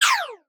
synth laser; retro synth

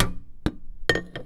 Plastic Thump; BB Retail Therapy Sample Pack

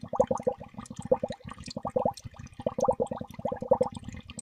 loop bubbles; water splash slime

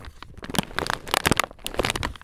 Crinkle Plastic; BB Retail Therapy Sample Pack

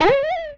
Beep

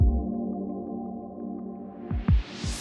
Fading Away, Pads; BB 2HTC Samples Vol 4 Addendum

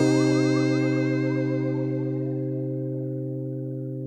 Fadeout Pads; BB 2HTC Samples Vol 4

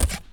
Monster Noise; BB Retail Therapy Sample Pack

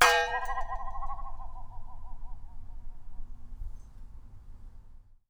Wobble, Japanese Pull Saw

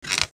wood squeak; wood metal SFX

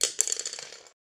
falling; breaking falling hit sfx

